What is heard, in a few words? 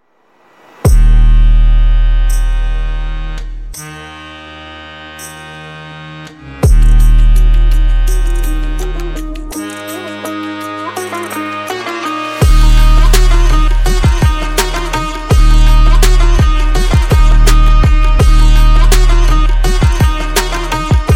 Ethnic Trap